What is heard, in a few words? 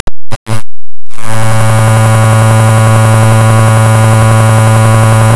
semi sinus; STM32; h743zi